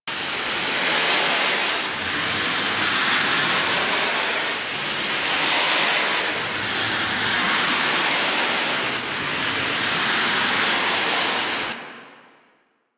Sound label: Luck Poison Loop; Warframe; Warframes; Dragon